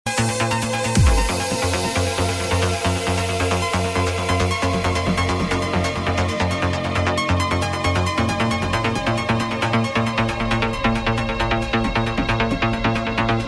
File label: trance